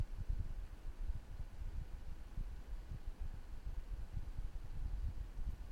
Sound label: shield fan